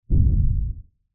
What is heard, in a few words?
Monster Footsteps, Horror, Monster, Footsteps, Lfe, Hit; Monsters; BlastWave